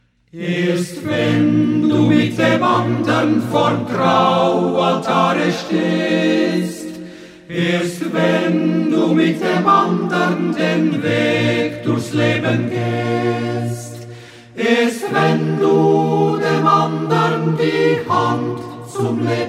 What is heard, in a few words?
Chor; Bajazzo